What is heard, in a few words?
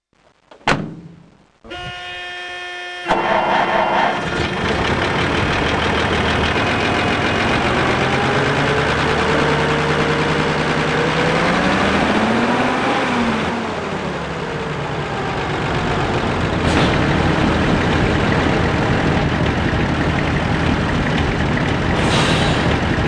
Goose